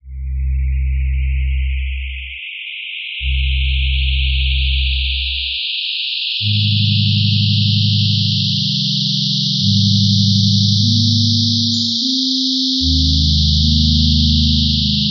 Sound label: furnace; furnace butterfly